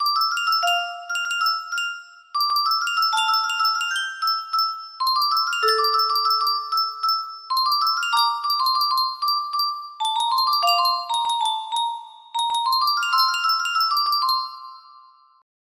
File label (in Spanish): yunsheng caja de musica